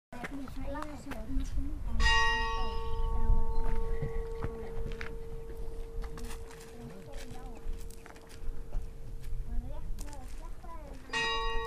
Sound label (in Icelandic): stadastadarkirkja likhringing